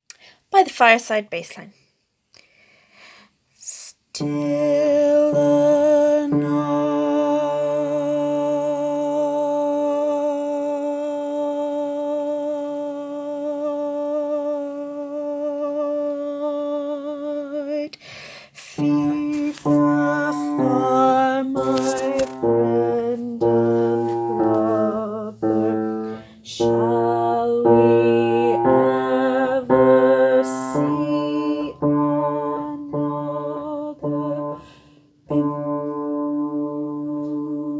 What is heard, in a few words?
bass